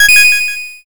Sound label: correct